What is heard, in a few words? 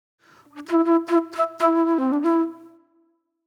Flute, Disco; Sonic Foundry (Sony Creative Software); Manhattan Flutes; Session 04 - Cruisin' Disco